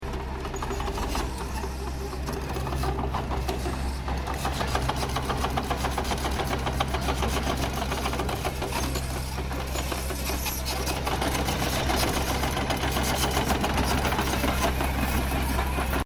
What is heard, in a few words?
Tractor Earthmover Small Maneauvering; Tractors; Vehicles